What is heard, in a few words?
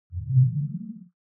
ui classic game start deep